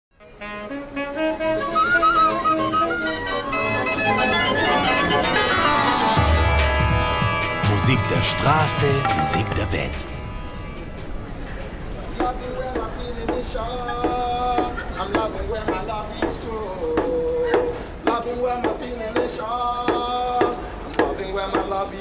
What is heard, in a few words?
Talking Drum Nigeria; musik der strase musik der welt